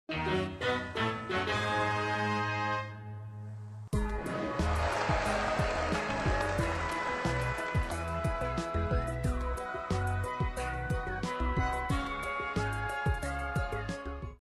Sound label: draw; brawl star